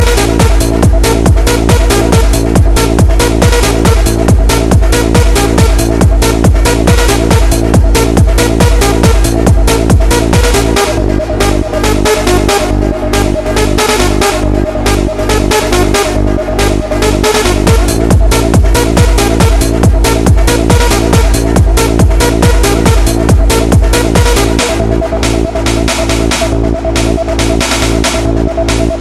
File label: Beat